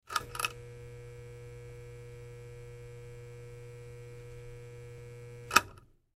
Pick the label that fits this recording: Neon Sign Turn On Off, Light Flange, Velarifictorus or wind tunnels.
Neon Sign Turn On Off